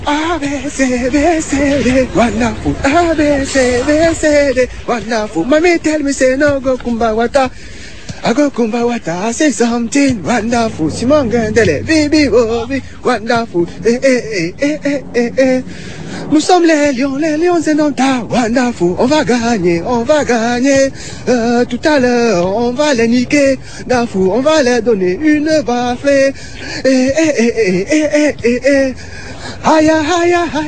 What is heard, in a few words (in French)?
Chant supporters camerounais